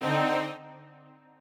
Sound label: strings